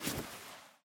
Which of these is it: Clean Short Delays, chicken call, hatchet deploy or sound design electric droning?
hatchet deploy